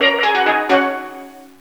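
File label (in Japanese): TrinityのSteel Drum。カリブ海の雰囲気出てますかね？; Caribbean1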